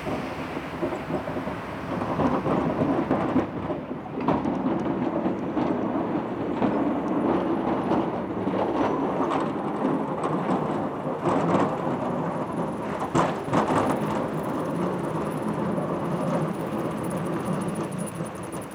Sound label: PLOW SCRAPE; JERRY APPS WINTER